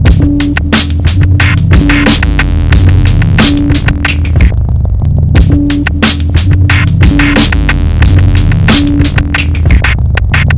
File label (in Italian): loop sparso